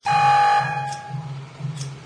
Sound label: beep; Source engine